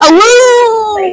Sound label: awoooooooh